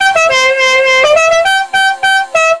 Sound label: dixie horn; daniel simion